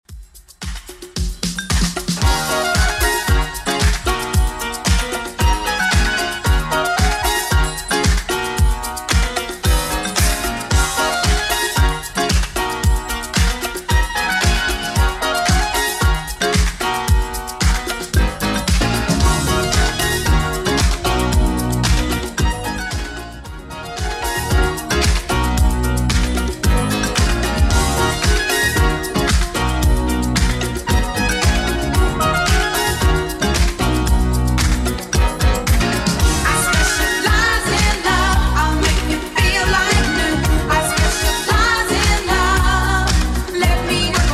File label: 80s Redrum 113bpm